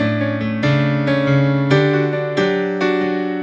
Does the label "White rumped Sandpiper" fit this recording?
No